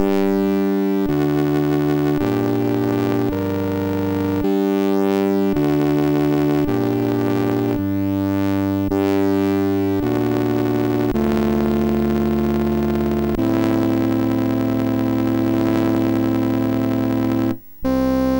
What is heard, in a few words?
sid, MOS6581R2; Secret of Monkey Island